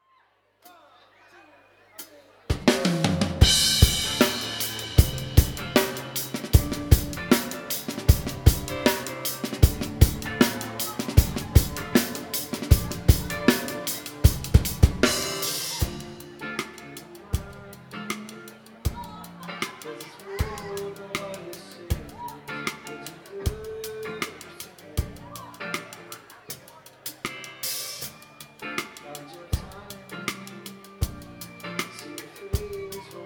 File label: Drums No Dyn EQ